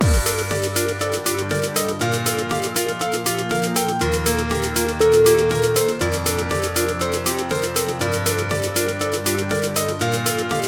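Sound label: dubstep dreams, music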